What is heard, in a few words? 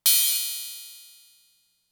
Cymbals, Elektron MachineDrum